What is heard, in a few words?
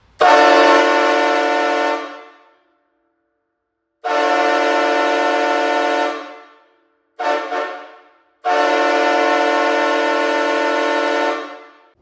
octohorn; big h0nkker